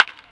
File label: tik; Charlie; Game Examples